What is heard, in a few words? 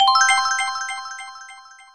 life up